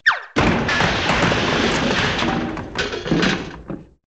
Udar

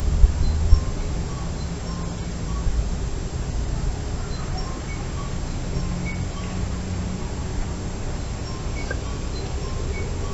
Zvono komsinicinog telefona

